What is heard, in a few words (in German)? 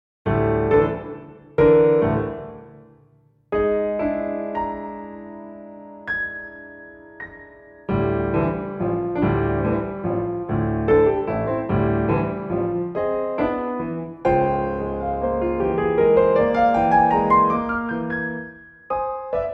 Piano Solo